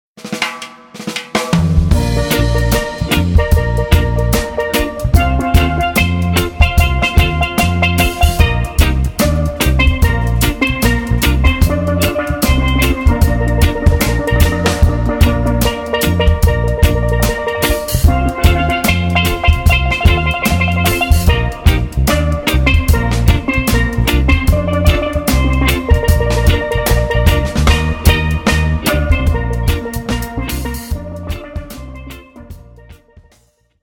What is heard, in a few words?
reggae steel Pan